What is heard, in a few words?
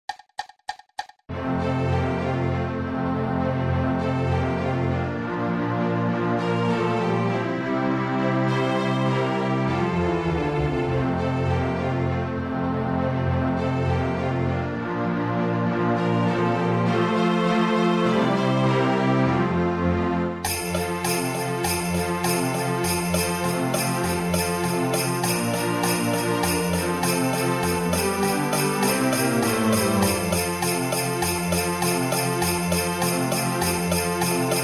jingle bells f, harmonica